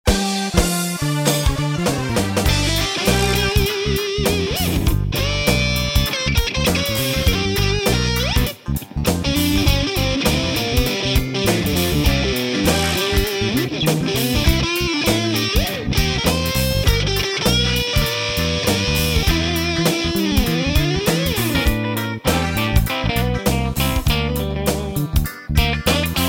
Blues Style; GFX-8

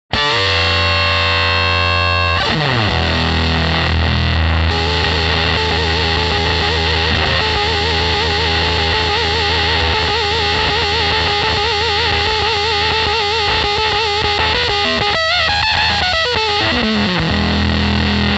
proctavia wide open

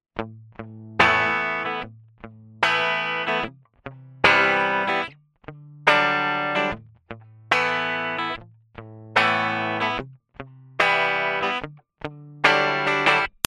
British crunch

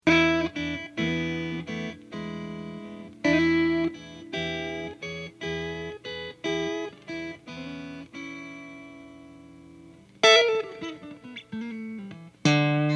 Blues Clean Blues

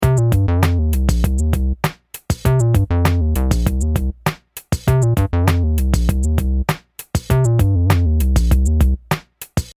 Minimoog Square Bass

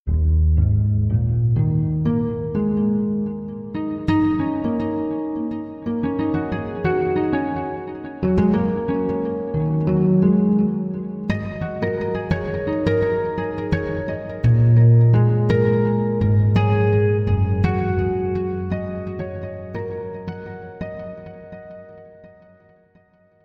mixed harmonix fx

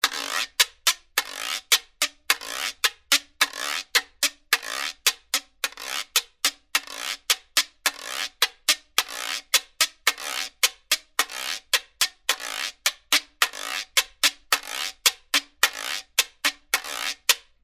Super Guiro

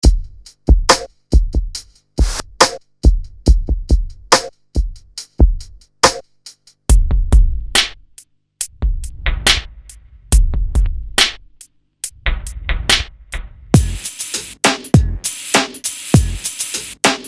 Street Kits Hip Hop

